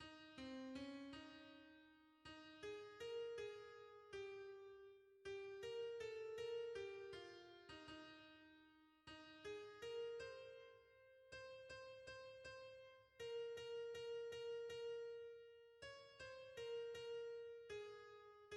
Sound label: soprano; Dans peu de temps; hymns